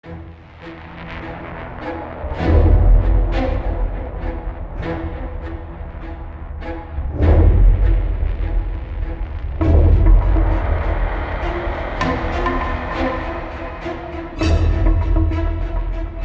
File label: Tension Loop; Crysis 2